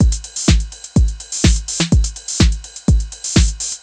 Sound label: drum loop noclap full 125, Classic Chicago House; Sample Magic; Drum Loops